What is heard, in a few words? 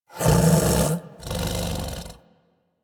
Snow Critter Vocal Snore; Warframe; Hunting Critters